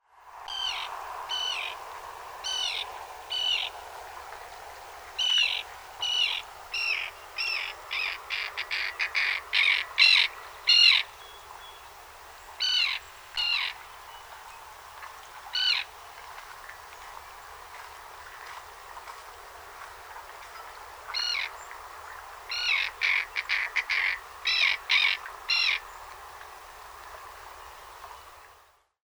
Common Tern

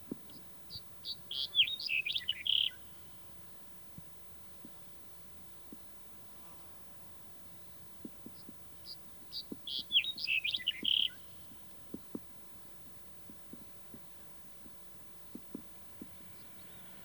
Black-headed Bunting

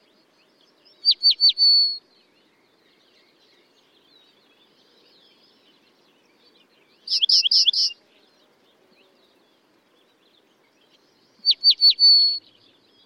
Cretzschmars Bunting

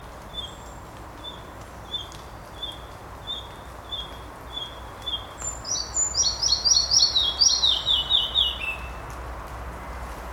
Red breasted Flycatcher

